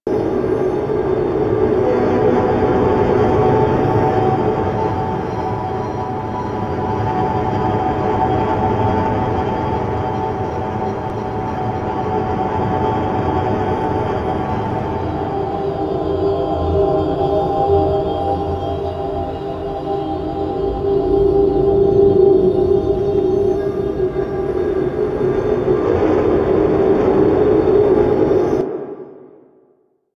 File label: Seance Room Amb Loop; Warframe; Ambience; Harrow Quest; SM Base Seance Room